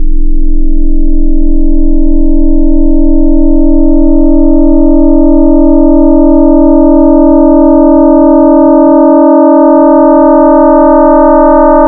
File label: Double Sines, wavetables; Cymatics; Jawz Wavetables; Serum